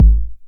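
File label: 909 BD tape, kick drum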